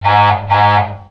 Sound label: horn; TrackMania United; Skins